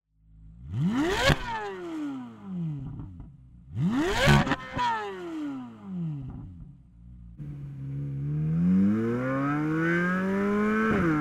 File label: Chevy Z06 engine